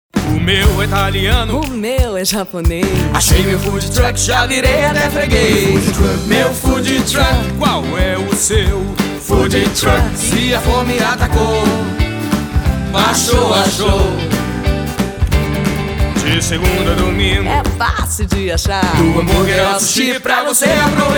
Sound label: JINGLE; AUTOTRACK FOODTRUCK